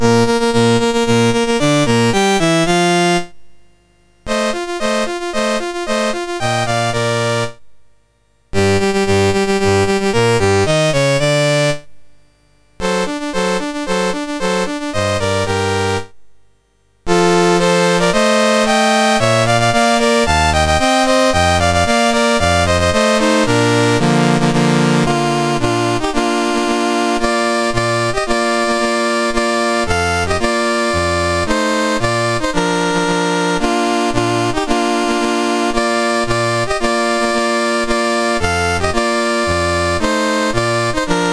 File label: MOS6581R4, National Anthems; Italy; Dave Marquis